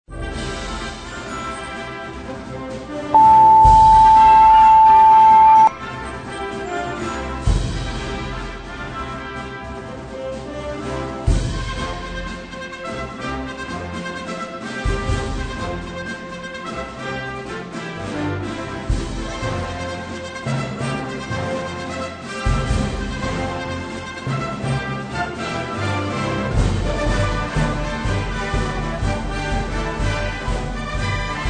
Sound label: March